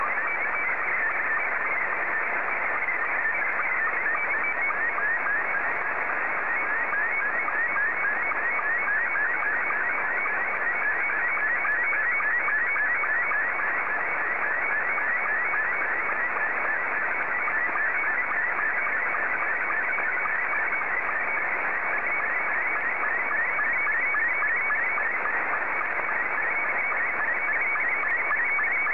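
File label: SSTV, Scottie 2; JA3QJQ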